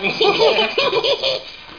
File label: cackle